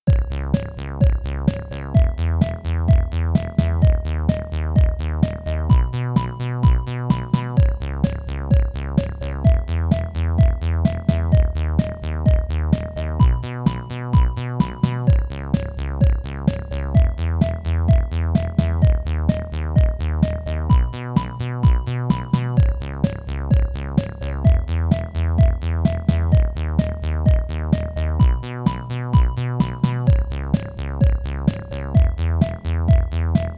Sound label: all your bass dee-x bells